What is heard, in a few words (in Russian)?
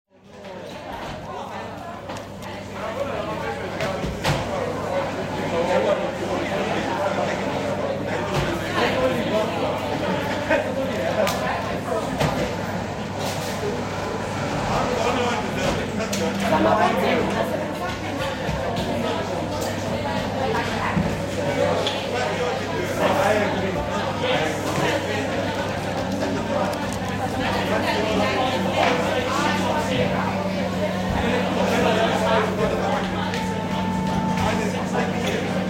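очень занятая атмосфера кофейни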